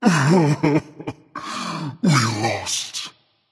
boohoo berserker; cuchulainn

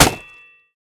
sword hit; smite; olympus

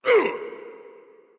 grunt; Smite; bacchus

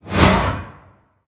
basic swing; smite; thanatos

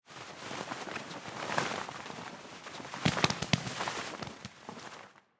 Defeat Flap; Smite; Susano

